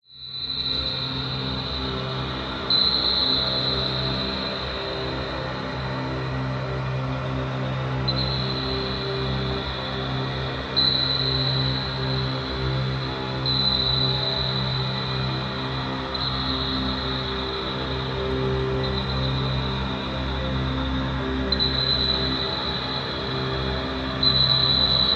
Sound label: science fiction atmosphere dark menacing airy occ high pitched shrill